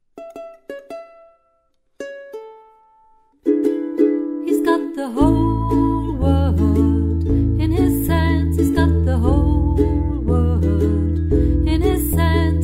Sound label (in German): mit u-bass; he's got the whole world; Spirituals